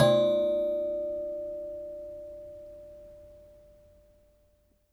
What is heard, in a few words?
pigeon